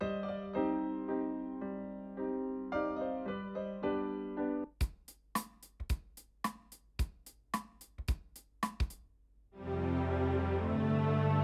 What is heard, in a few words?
AKG Solidtube